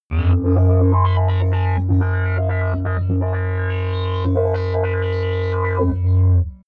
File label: scientific alien; comedy-cartoon; aliens